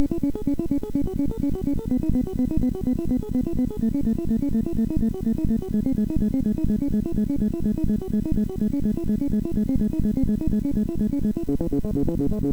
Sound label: Classical 2, MOS6581; hvsc